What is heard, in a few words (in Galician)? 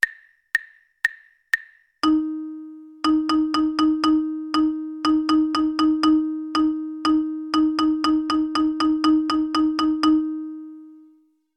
RITMOS